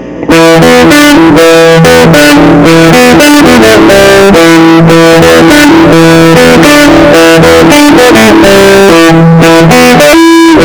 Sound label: Civil War Treble, Big Muff Fuzz; guitar riffs; Stoner Rock